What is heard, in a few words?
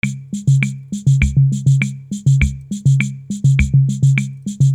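samba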